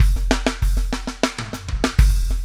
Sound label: SHRED, Backbeat